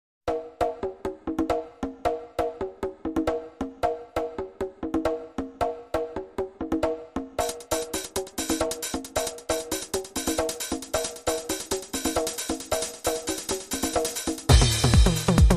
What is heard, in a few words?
Tribal Space Trance